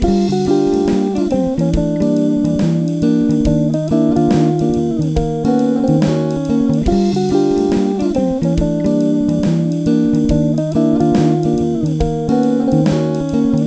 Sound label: music